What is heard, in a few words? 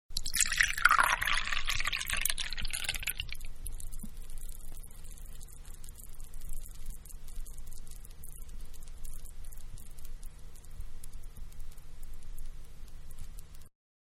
Wine Small Glass, Wine-Pour Into Small Glass; Pouring (Sound Effects:Objects); Manhattan Production Music